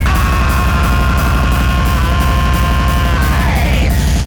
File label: Kaos FX, Ambient Grooves